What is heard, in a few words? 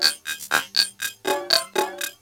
LOOP, TECHNO; TECHNO-BOX; ELEC-LOOPS